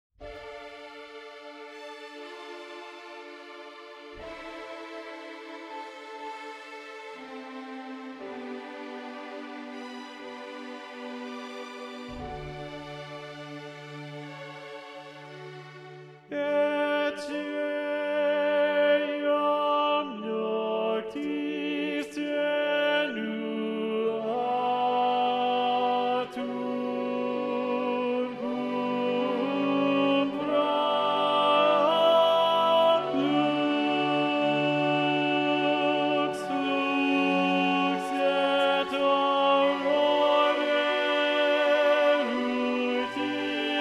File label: Tenor; Practice Room